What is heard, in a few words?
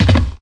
Footsteps; unreal development kit